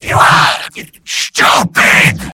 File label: win; freak fortress 2